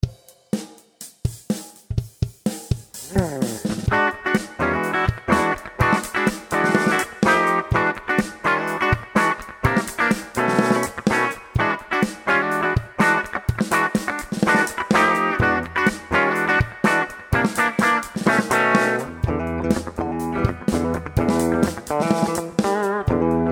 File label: Clean funky bridge